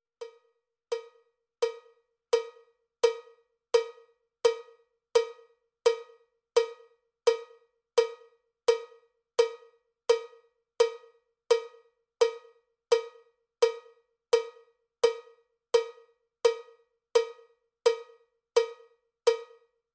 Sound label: cowbell click track bpm 79; cowbell click track bpm 85